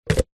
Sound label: sport cone plastic stack others